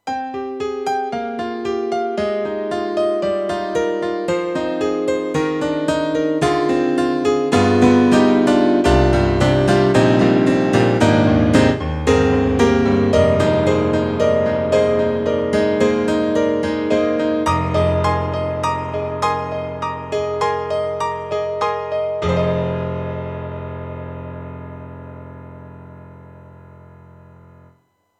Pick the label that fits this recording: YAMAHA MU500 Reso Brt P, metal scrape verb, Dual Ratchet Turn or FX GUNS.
YAMAHA MU500 Reso Brt P